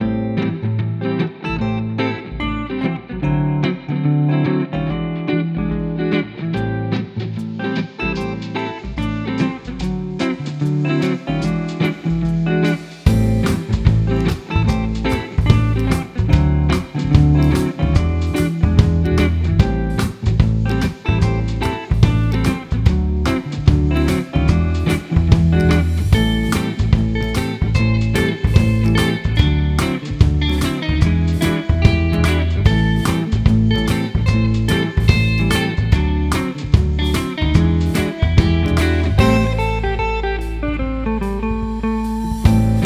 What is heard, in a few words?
Jazz Guitar; Childs Intro Jazz